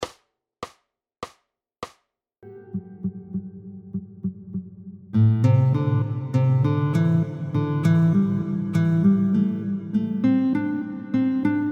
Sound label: Guitar Gym Sequencing oefening